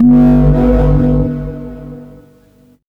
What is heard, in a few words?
bass; Drum&Bass; Instrument Samples; EZRollers